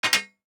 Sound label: DICE on Metal, Drop, Standard, Four Dice; Samples; ArticulatedSounds; DICE Sfx Party (Extended)